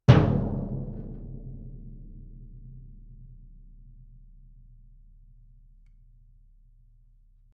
bass drum fff; Struck Membranophones; Bass Drum 3

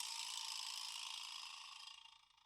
vibraslap; Idiophones; Struck Idiophones

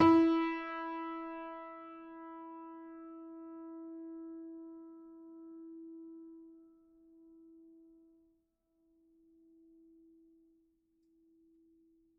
GPiano sus E3, Grand Piano, Kawai; Chordophones; Sustains

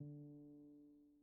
GPiano sus D2, Grand Piano, Kawai; Chordophones; Sustains

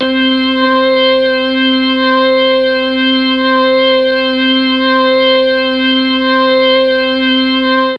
C3 organ, mellotron

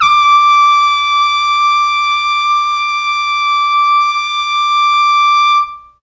D4 trumpet, mellotron; CHMB TRUMPET

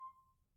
Grand Piano Main Release C5, Kawai; Legacy

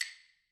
Claves Hit; Idiophones; Struck Idiophones

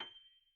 GPiano sus G6, Grand Piano, Kawai; Chordophones; Sustains

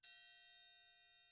triangle hit mp; Idiophones; Struck Idiophones; Triangles

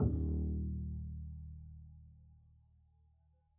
pizz eb1, double bass; dsmolken

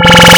distorte

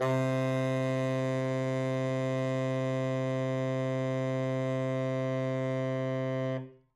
Tenor Saxophone, Non-Vibrato, C2; Reed Aerophones

